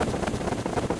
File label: Cutter blade spin